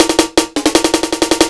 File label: 160 snare fill military